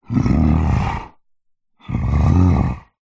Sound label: horror yeti monster growl grunt; zapsplat